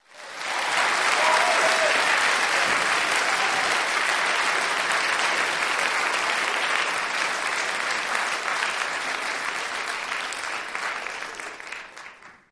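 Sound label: Applause Medium Crowd Indoor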